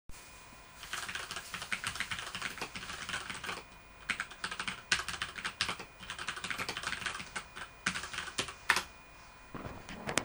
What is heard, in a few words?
keyboard